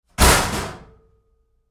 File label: Door Locker Close Roomy Hard, Metal Door; Metal Doors; Big Room Sound